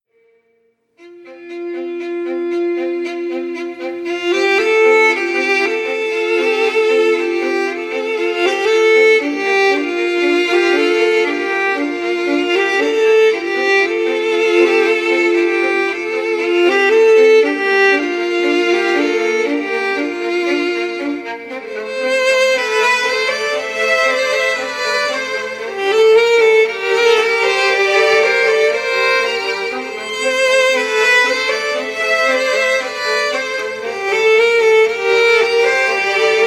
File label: VIOLIN urban mix